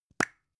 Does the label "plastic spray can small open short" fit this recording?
Yes